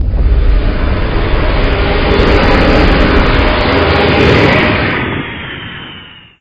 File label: alien flying